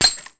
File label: Lever Action Rifle Shell Eject; Weapons